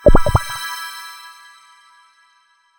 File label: Thought Bubble Fade In; Ruins